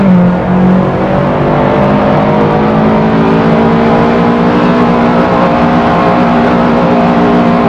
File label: third, vehicles cars; lwcars